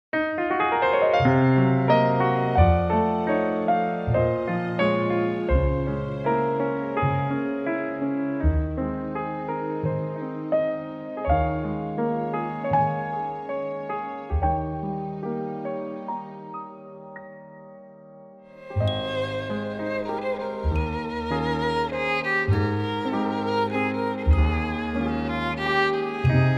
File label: violin